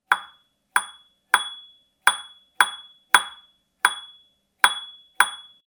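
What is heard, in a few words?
metallic clang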